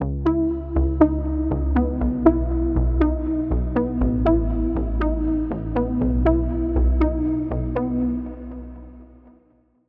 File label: Key, Spire; Vague Conscious; Routed Sound; Spire Expansion; Reality Maker Vol.1